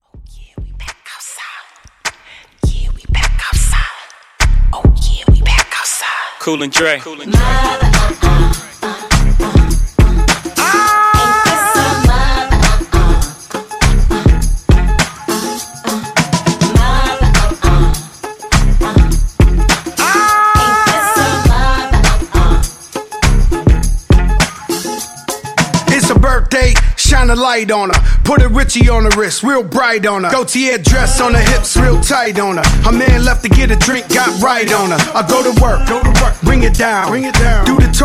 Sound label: Hip Hop RB Redrum; Remixfreakz